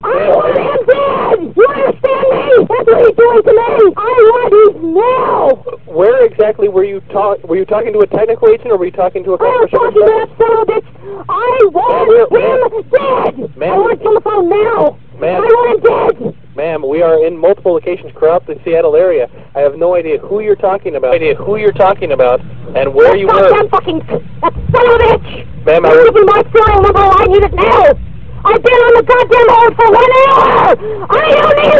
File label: screaming lady